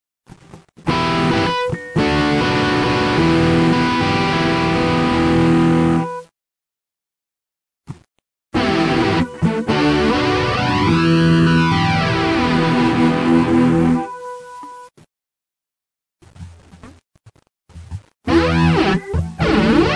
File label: Flanger OD